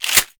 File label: Q Pump